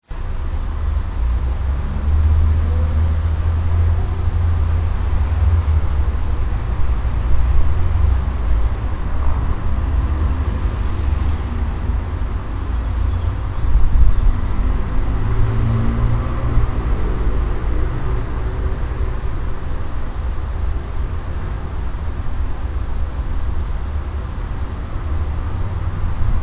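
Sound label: traffic light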